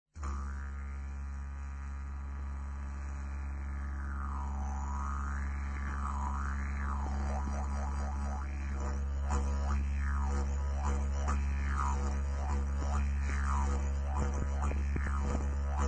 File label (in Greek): Ντιτζεριντού